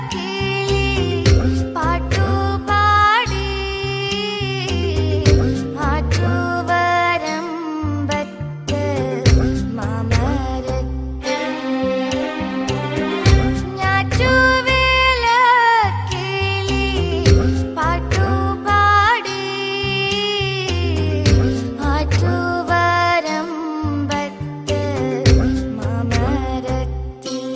malayalam musical ringtone

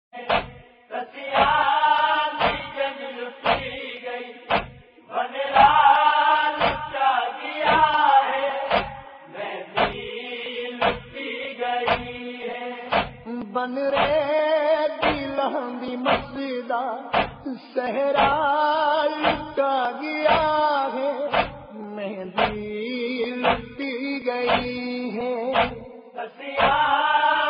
noha tune

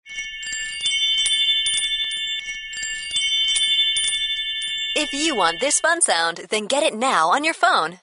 wind chime ringtone

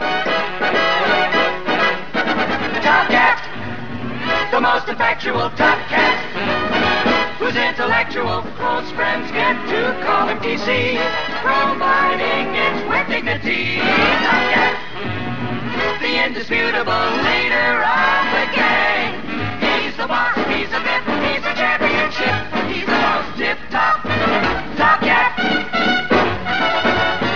classical cartoonic background music tone

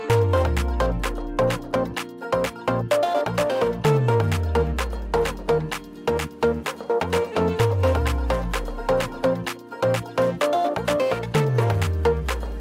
music ringtone, bollywood ringtones

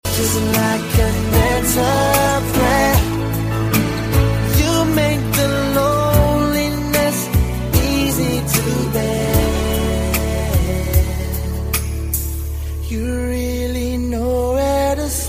sad english song tone ringtone

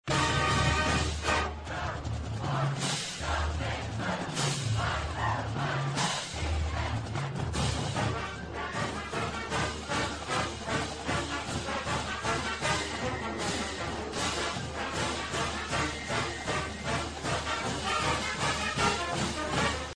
fight song w lyrics; boise state

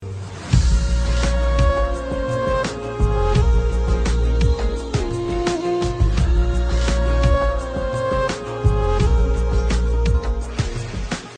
flute ringtone

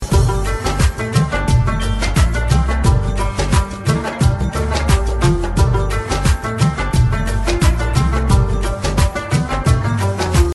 pakistani music ringtone

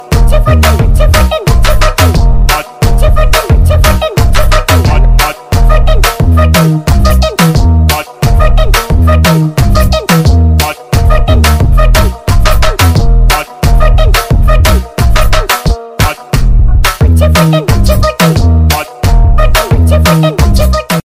english music

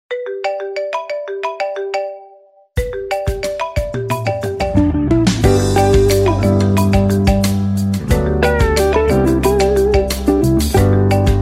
apple ring ringtone

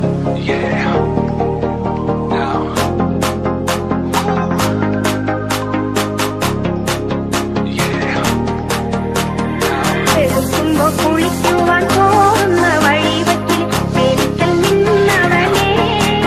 beautiful malayalam ringtone

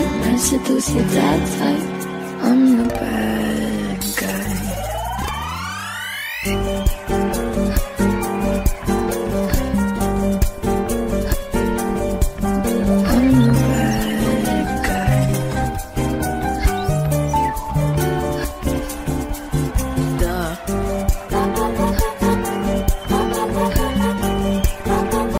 new english songs ringtone

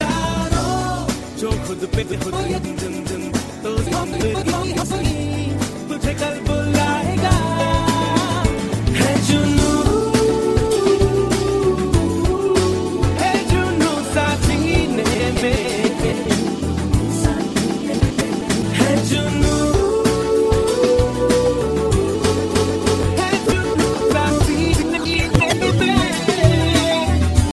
cool samsung ringtone; samsung a95

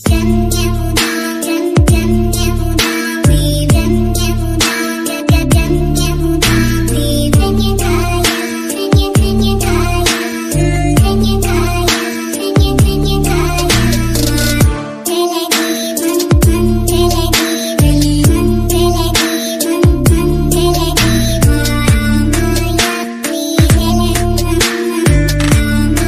remix ringtone; english ringtones

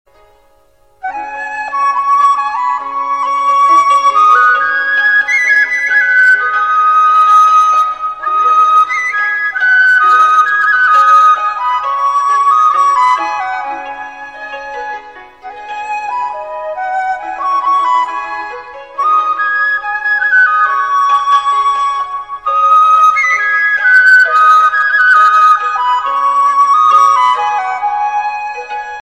chinese flute romantic ringtone

